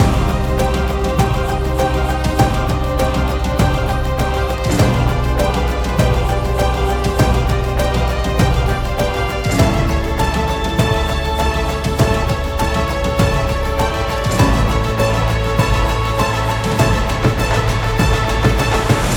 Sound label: Library Fantasy